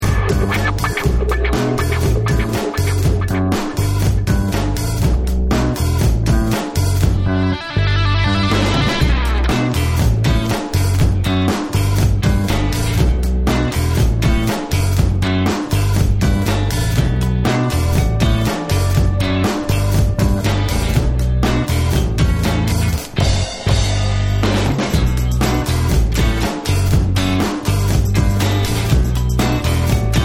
GARAGE BEAT

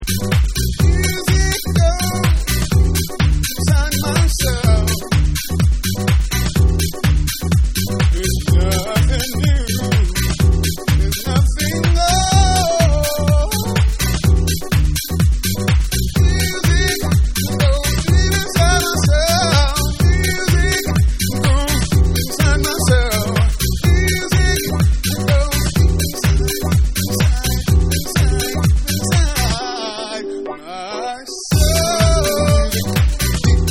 Deep Flute

